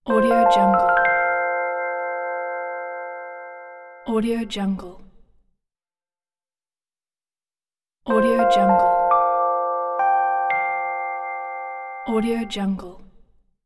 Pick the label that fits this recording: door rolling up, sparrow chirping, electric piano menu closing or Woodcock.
electric piano menu closing